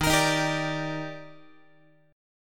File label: D sharp Major 7th, Guitar; strummed; JGuitar